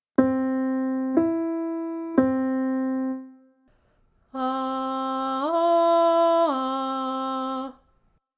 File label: Vocal Exercise